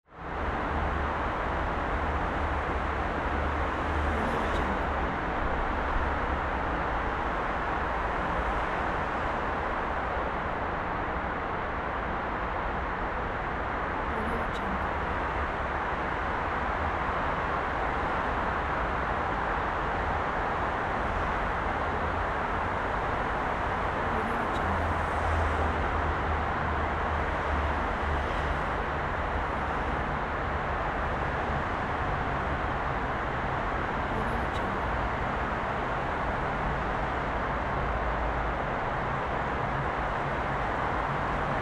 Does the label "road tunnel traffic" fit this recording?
Yes